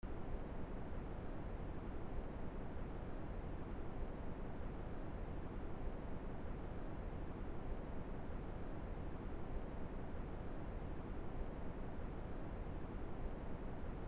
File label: Ocean Waves White Noise; 1 h; Podcast